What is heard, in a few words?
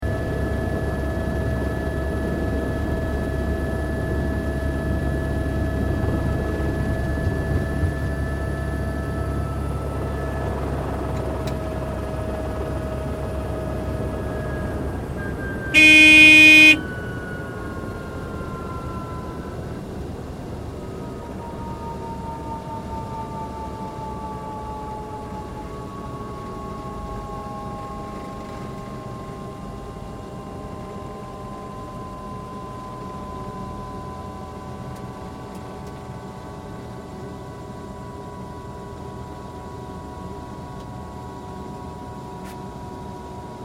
Helicopter Landing Sequence; A helicopter lands; sounds warning horn once and slows down, settles, engine slowing.; Helicopter Sound Effects